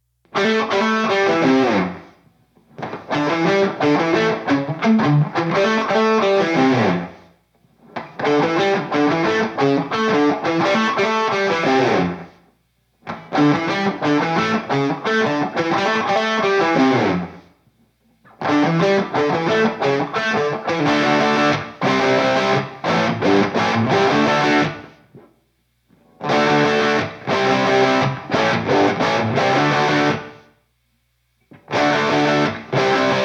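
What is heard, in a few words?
Guitar rhythm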